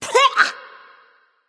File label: clear throat; smite